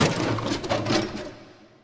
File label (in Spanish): barriles; Steven Universe - Beach City Drifters